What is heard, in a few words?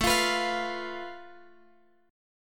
A Suspended 2nd Sharp 5th, Guitar, Open G